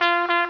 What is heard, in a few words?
horn toot